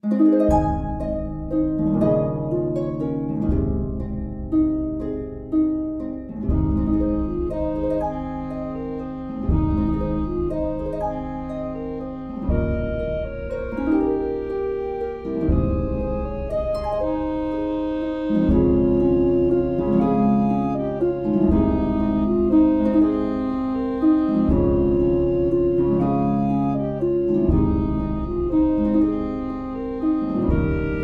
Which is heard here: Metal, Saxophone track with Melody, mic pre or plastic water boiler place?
Saxophone track with Melody